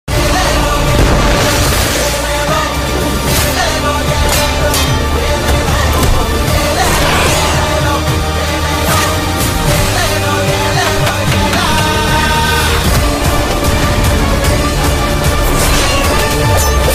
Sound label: trailer bgm ringtone; tovino thomas